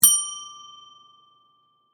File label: Bell Desk, Office, Bell, Desk; Miscellaneous Office Sound Effects; Blast Wave